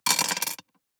DICE on Glass, Throw and Roll, Standard, 5 Five Dice; Samples; ArticulatedSounds; DICE Sfx Party (Extended)